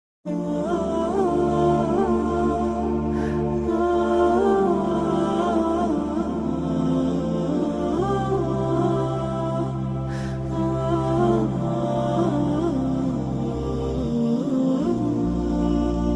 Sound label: islamic music islamskiy minus